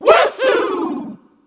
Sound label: metal mario